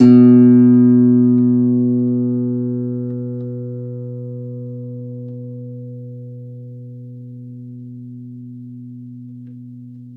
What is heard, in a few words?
Soft B2, Campfire Nylon guitar; Worra's Campfire Nylon